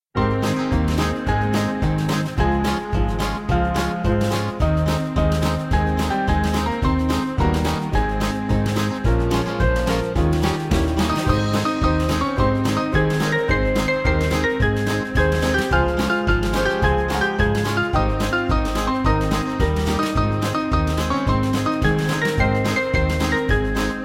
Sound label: Amercian Melody Band; Small Band